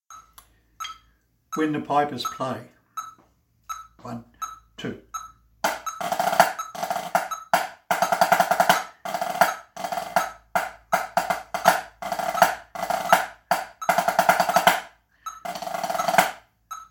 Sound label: When The Pipers Play drum